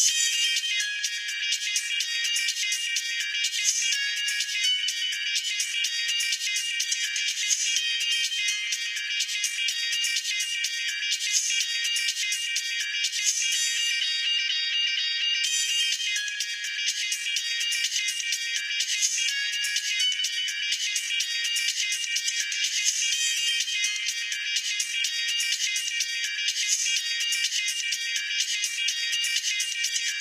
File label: Nokia Ringtone 1.3